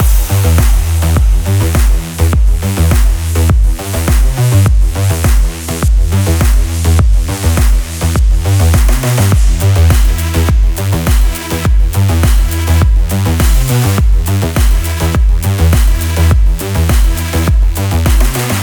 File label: action sport